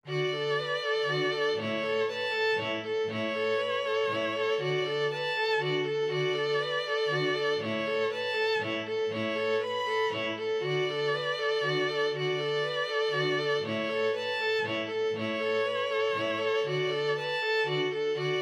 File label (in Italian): Danza in re per 2 Violini e Basso